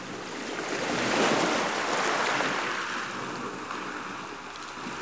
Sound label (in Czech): Vlny; wob2 demo